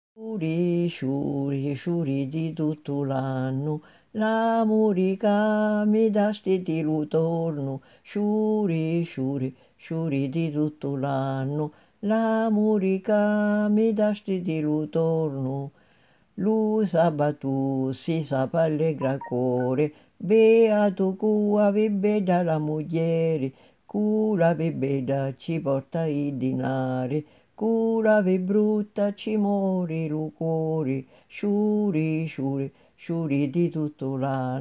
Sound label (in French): chanson de sicile « ciuri ciuri »